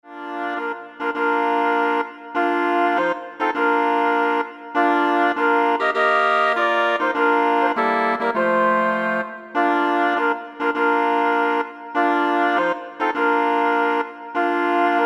Woodwind Ensemble; Wagner Bridal Chorus

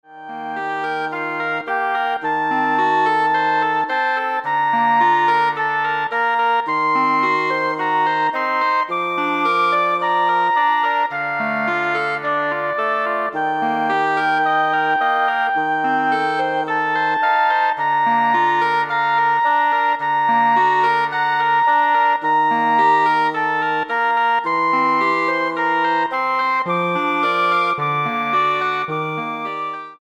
Woodwind Ensemble

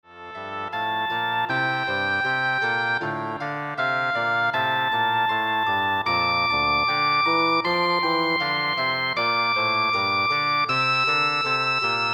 Oboe Bassoon

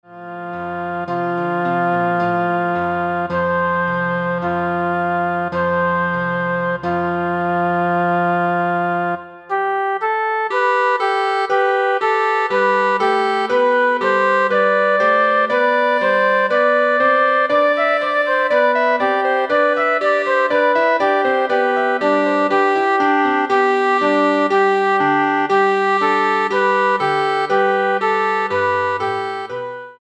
Flute Clarinet Frere Jacques

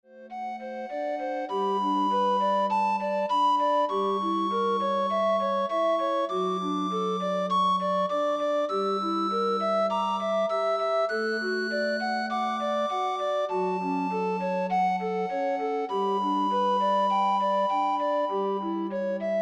Recorder Ensemble

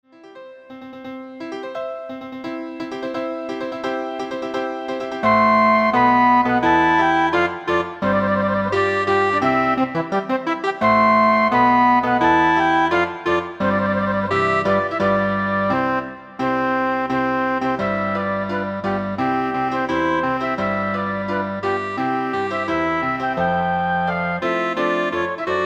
Flute Clarinet

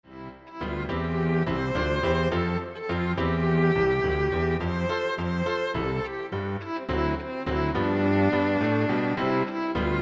Piano Trio

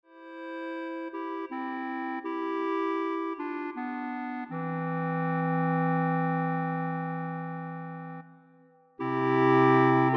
Clarinet Ensemble

